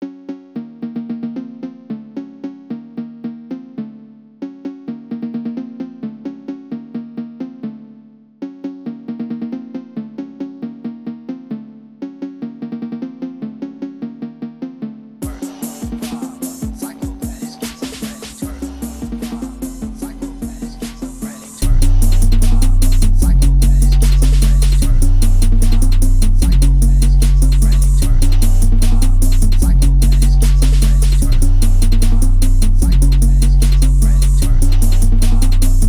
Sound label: PHONK